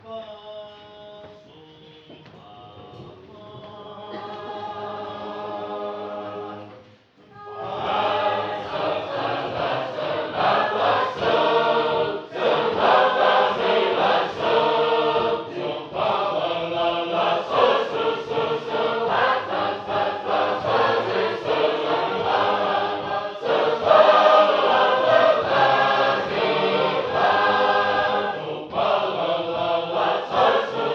Northfield, sacred harp

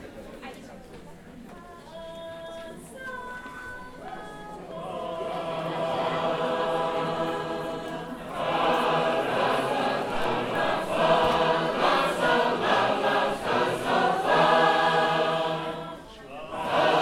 Sacred Harp; Burk

